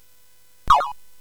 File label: Dark Castle, CSG8580R5